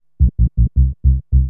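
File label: synth bass, drum and bass, 160 bpm, C; eJay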